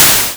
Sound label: hi hat; DumbDrum